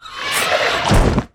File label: DIE